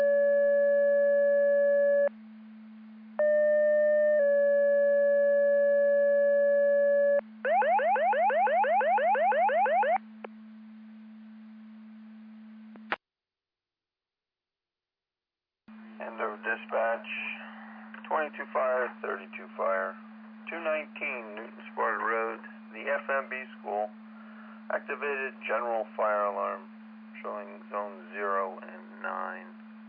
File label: Siren; Andover Twp Boro